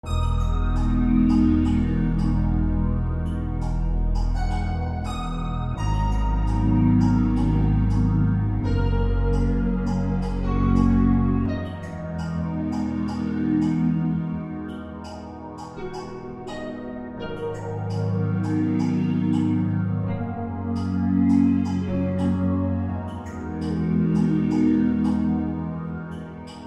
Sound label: Flower 84 G minor r b